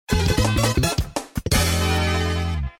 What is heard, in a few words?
course clear